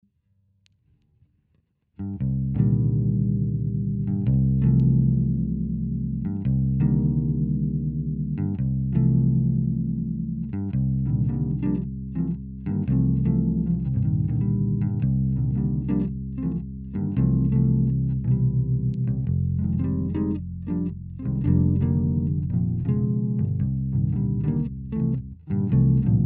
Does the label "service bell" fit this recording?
No